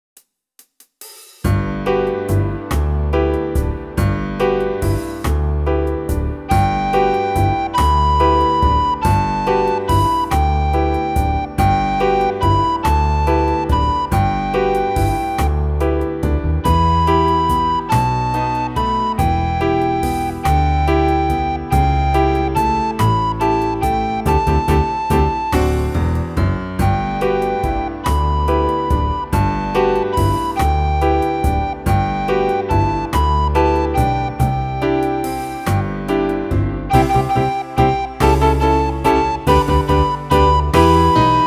Waltz, recorder; red hot recorder tutor

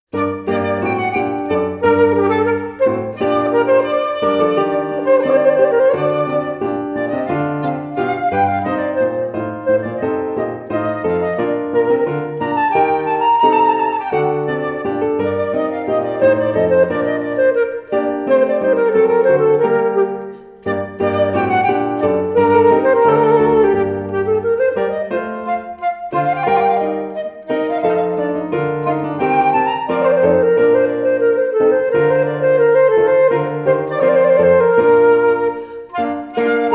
Allemande, flutes; flutes grade 5

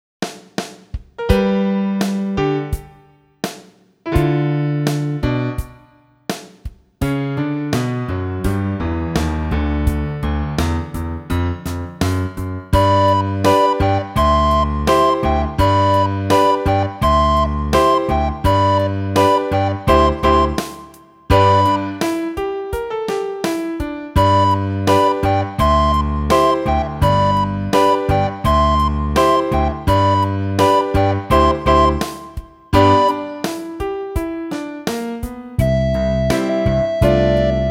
Rock Practice Tempo, recorder; red hot recorder tutor

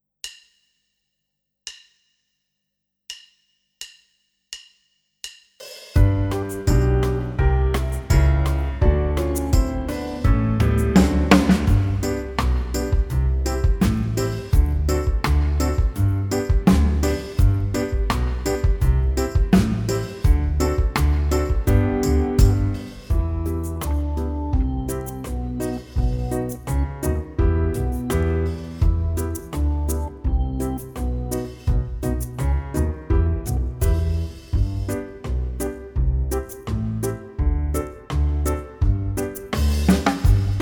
Perf

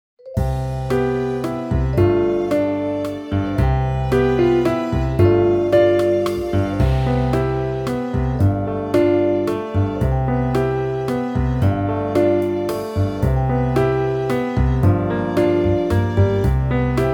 Waltz; razzamajazz recorder

